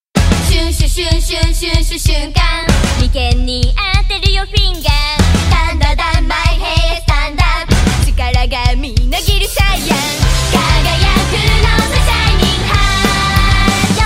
ringtone; dragon ball super ending 5; ost

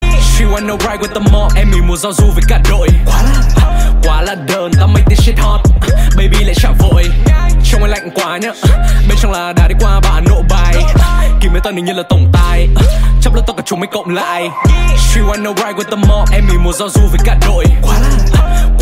rap

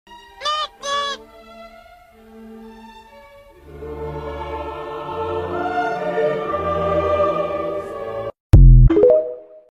Nhac Chuong Noot Noot Meme Ringtone

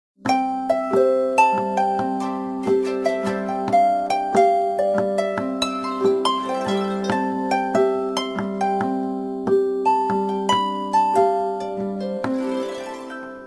morning flower alarm; bao thuc

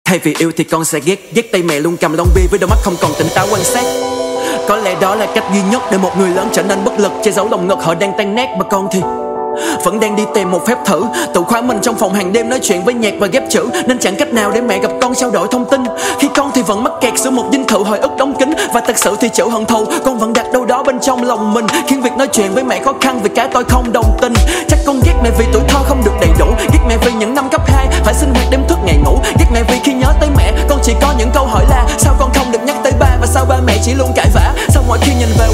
rap

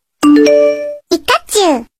sms; whatsapp pikachu

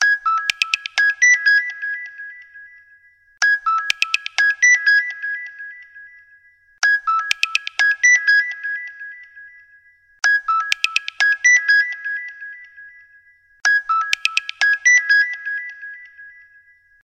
nokia g60 original ringtone

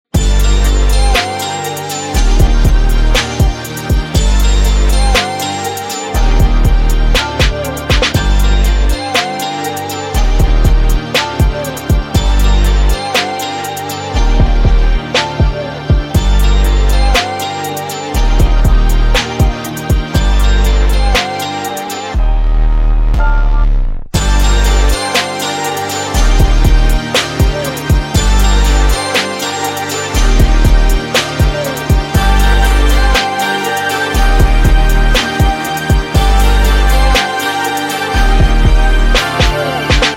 instrumental

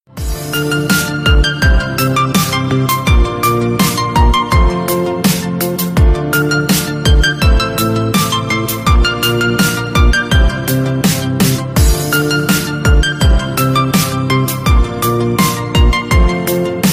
Piano

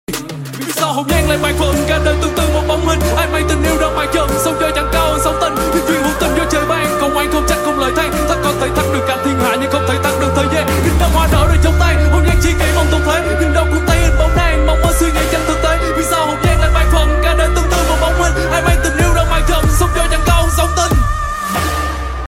rap

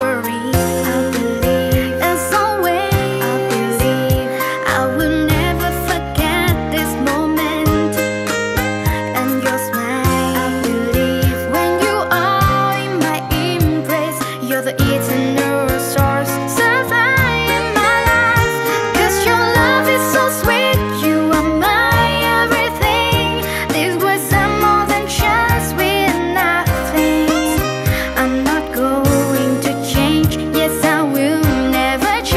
English Cover

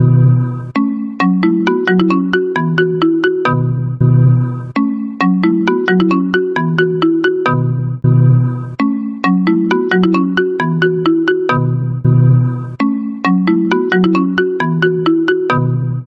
iphone; new iphone 15 plus

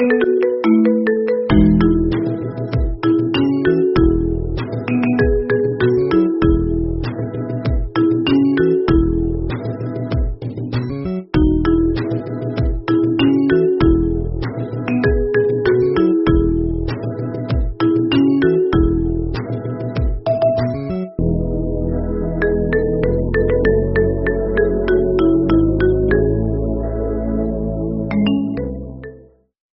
marching band iphone

